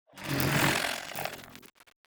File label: greetings arthopoid special; Stellaris